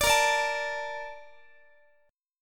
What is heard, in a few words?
A sharp Major 7th, Guitar; strummed